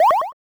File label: Magic start; Syrup 2 Candy Alchemy RPG